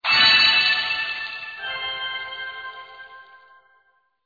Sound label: Tear Of Light Touch